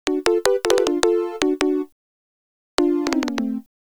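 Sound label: Flutes & Swingers Keys D; Zero-G Kocktail Kollection; Construction Kitz (Lounge); Flutes & Swingers 156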